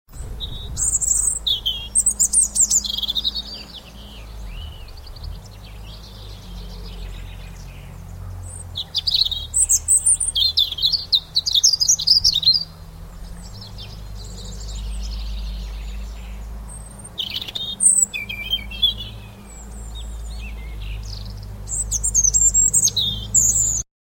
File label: robin